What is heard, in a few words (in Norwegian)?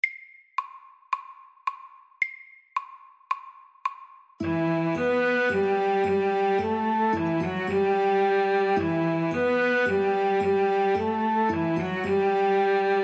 Russisk vuggesang FORT